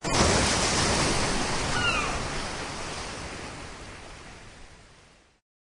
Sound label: wellbeing fx ringtone; motorola